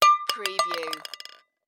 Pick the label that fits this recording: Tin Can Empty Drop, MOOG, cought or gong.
Tin Can Empty Drop